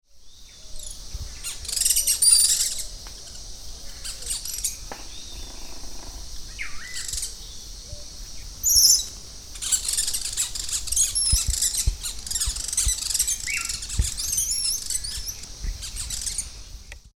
Pachyramphus validus Caneleiro de chapéu preto

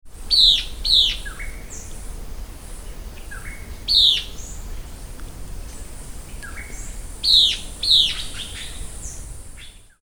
Lathrotriccus euleri Enferrujado

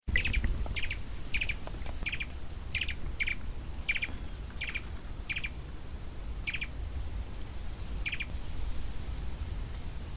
Formicivora rufa Papa formiga vermelho

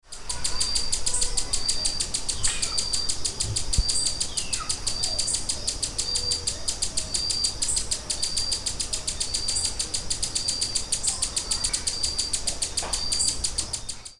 Thalurania glaucopis Beija flor de fronte violeta

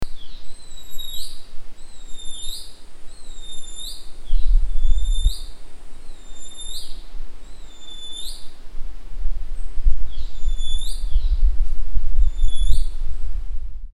Laniisoma elegans Chibante

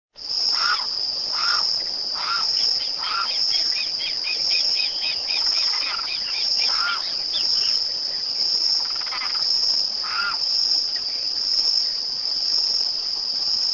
Aramus guarauna Carão

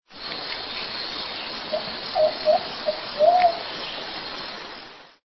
Patagioenas plumbea Pomba amargosa

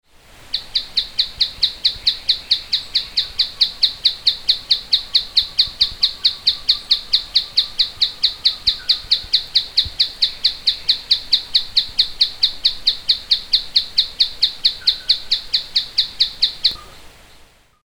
Scytalopus speluncae Tapaculo preto

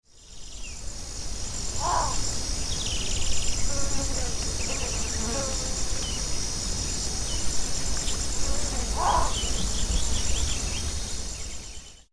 Ixobrychus exilis Socoí vermelho

